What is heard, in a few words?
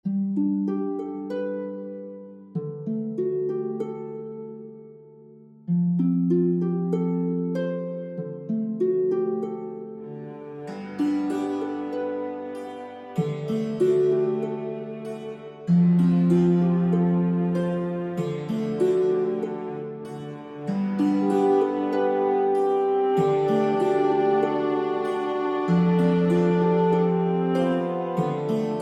peaceful forest